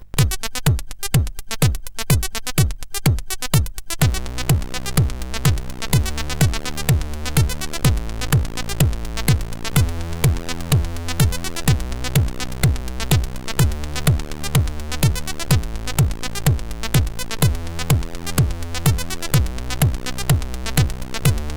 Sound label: CSG8580